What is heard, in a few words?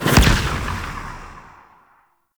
Combat Operator Void Melee Punch; Warframe; Focus; Operators